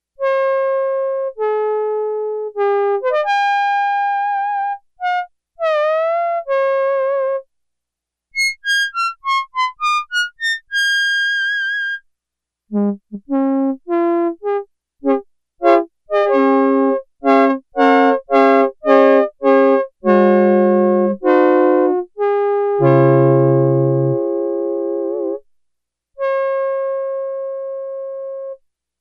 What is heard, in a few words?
Trumpet Flute